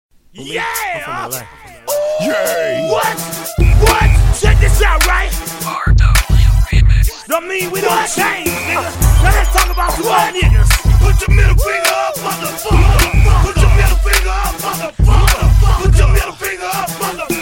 Hip Hop Remixes

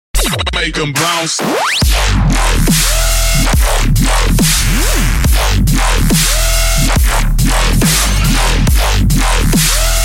BOUNCE DUBSTEP

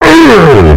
twhomp earrape

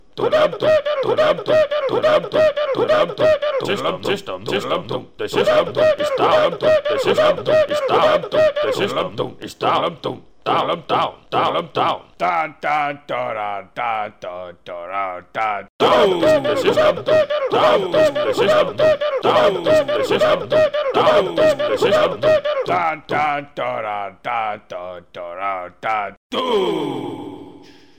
Techno; Strongbad

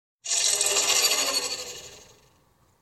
XBOX ONE OFF

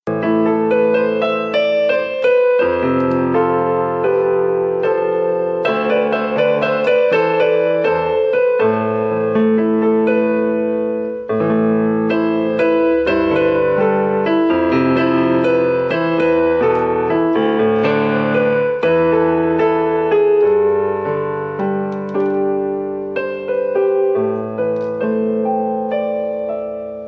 Piano improvisation

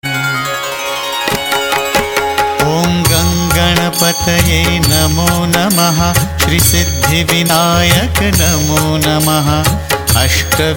Ganesh Aarti

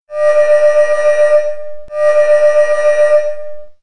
Cyborg Ringtone

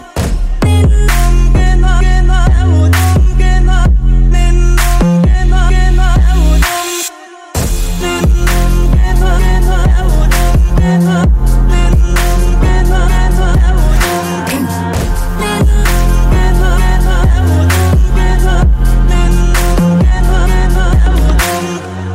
Trap

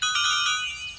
Turn The Page

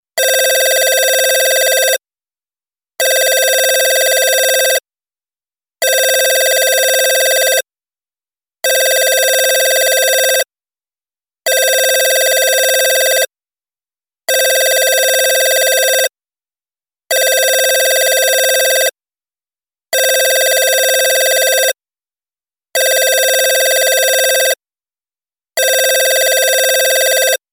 Different old phone

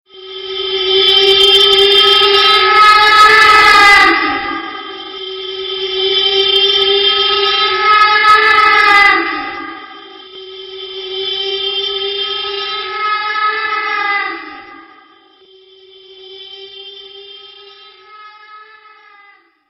Reversed Tone

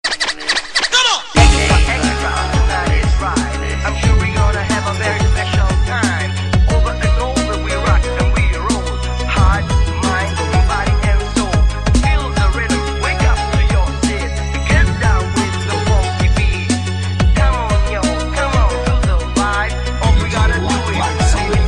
Melodi Remix

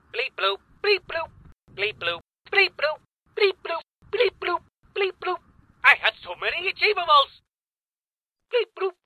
Bleep Bloop

